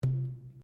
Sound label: Wooden Column Impact Fist